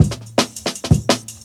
Jungle Breaks

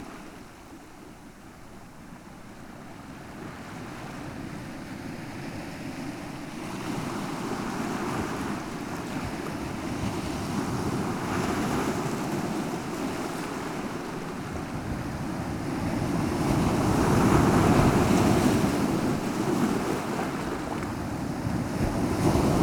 water surf Moderate Water Wave

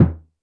Surdo Mute, Standard Kit, Yamaha PSR-E323

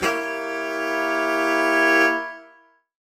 Horn Swell Alt Gdim; undercover samples

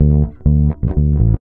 REAL BASS, BASSLICKS; Ueberschall; Jam Box

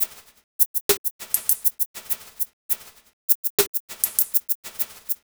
First Step Beat, Electronic Beats; Sonic Foundry (Sony Creative Software); Myths of Technology

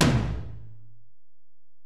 Tom, Alesis DM-PRO

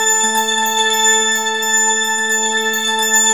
SYNTH CARILN

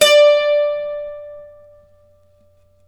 d4, Mandolin; Guitar; Terkelsen

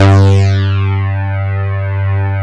FUZZY BASS, Bass Synthetics; E-MU Producer Series Vol. 2 – More Studio Essentials

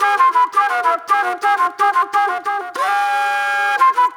Flute, Disco

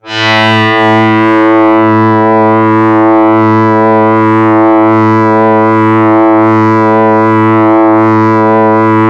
DoubleBass a2, Yamaha YX81Z

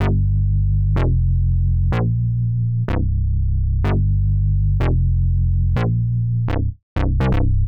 Synth Bass A, 125; PowerFX - Nu Jazz House

